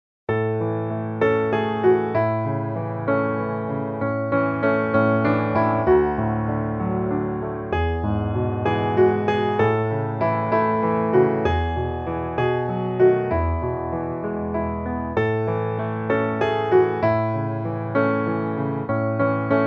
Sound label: piano